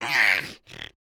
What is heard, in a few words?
goblin scream